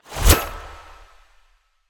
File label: Ice Spell Utility Detect Magic; Utility Spells; Arctic Aura